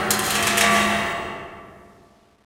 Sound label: metal stress